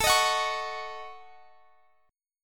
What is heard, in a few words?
A 6th Flat 5th, guitar